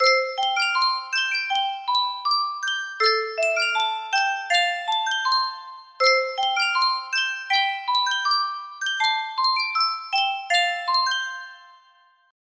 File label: sankyo music box